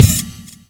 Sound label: Jazz Break